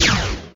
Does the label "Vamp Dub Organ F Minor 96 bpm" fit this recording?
No